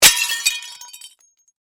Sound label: Impact Mirror Smash, Glass; Miscellaneous Impact; BlastWave